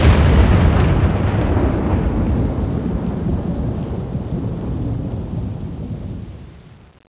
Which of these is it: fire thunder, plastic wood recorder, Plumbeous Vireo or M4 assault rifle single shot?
fire thunder